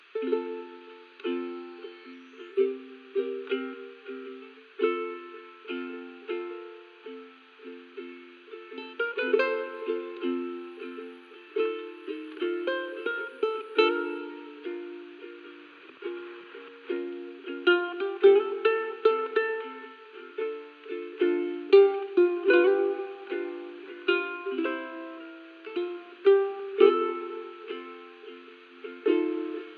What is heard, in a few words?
radio carib